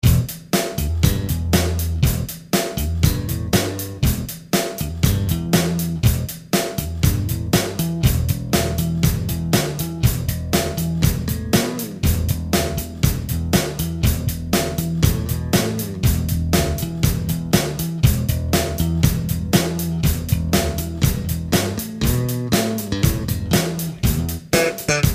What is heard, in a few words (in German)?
Die Bass tuns; neue Dimensionen